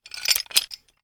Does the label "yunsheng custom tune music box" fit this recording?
No